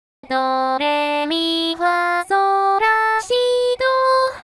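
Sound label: magenta solfege Japanese; KITA; 高音キタ; cute + natural + light; Kita's original lively tone.; pop, dance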